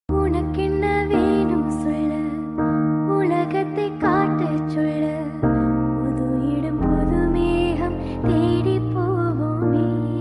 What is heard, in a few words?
Father Love Daughter Tamil Song